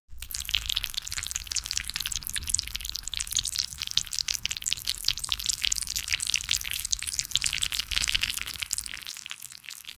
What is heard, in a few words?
subtle wet squishing flesh; fleshy